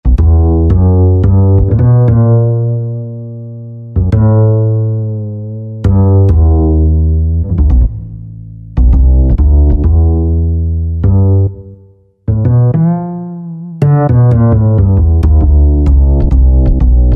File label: Upright Bass, Korg TR-Rack